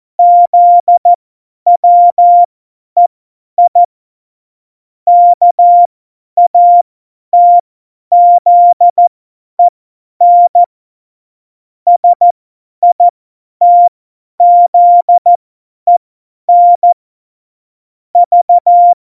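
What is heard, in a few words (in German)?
Witz, Morsekurs, cw morsen; Tempo 50 F70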